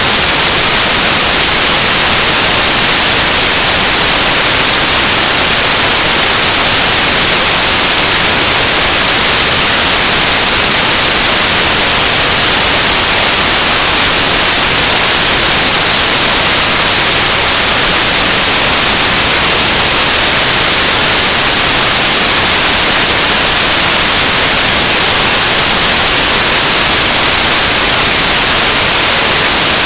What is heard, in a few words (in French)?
bruit blanc; attenuation geometrique son